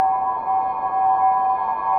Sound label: Wind; Old Wind; Inspired by Nature by Dillon Bastan; Tree Tone Presets